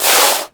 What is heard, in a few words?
spray; orla